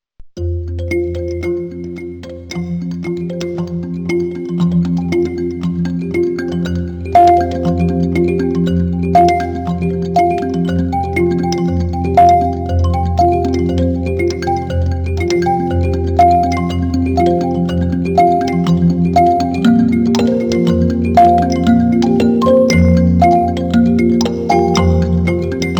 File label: array mbira solo